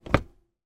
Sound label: car arm rest down; transportation